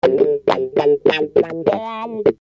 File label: Wah Guitar; Construction Kit; HIP-HOP; Sonic Foundry (Sony Creative Software)